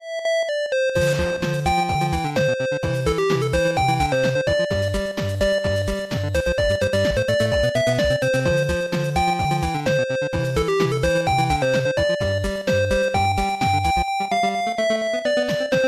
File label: 8bit, ringtone